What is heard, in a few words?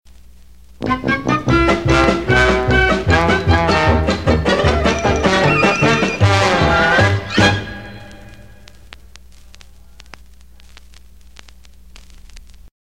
Bridge, Cartoon Music; Cartoon Music Discs